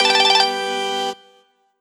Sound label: E-mail Trill